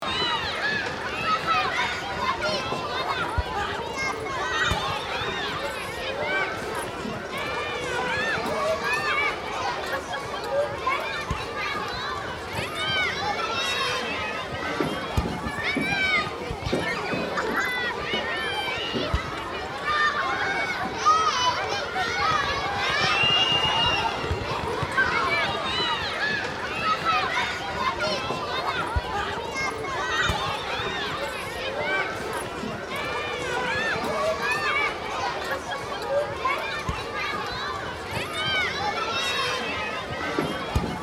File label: Elementary school playground children playing